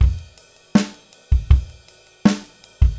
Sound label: Slow Groove Beat, Rock Drums